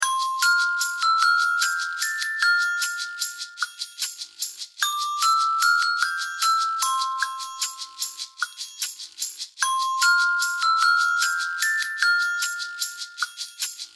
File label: Let's Play Our Instruments CHIME BARS ONLY